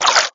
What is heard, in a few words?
big splash